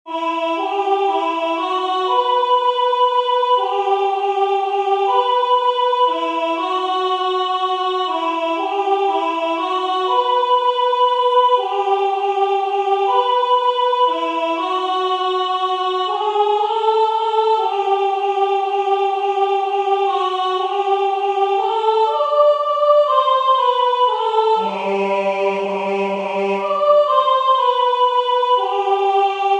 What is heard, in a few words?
Alto; God Rest